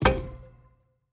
explode panther

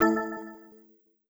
Wild Water Stacked

